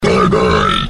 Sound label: bye bye burp